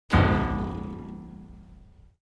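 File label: Grand Piano Strings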